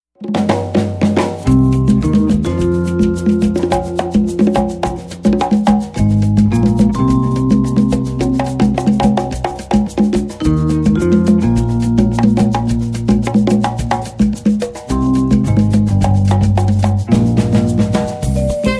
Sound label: Latin Jazz